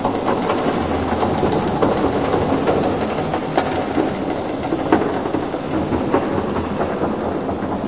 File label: Office; hl2